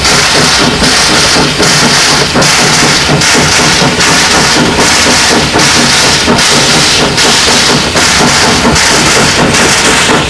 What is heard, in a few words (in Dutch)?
stoom; Cail